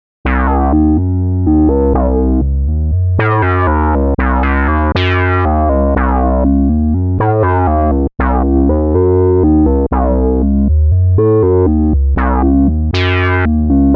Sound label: Funk